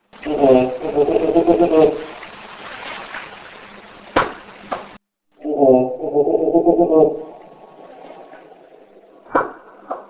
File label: Weird Noise; Sounds like a human saying "uh oh", many times fast, or laughing. The clip has the original once, and cleaned versions twice; The Jerome Ghost Report